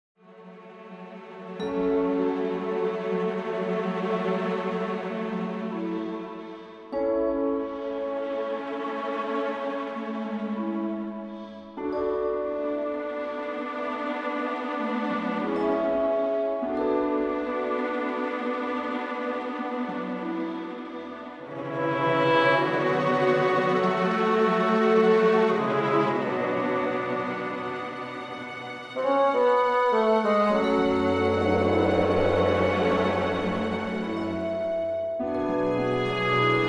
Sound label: Film Scoring sad cartoon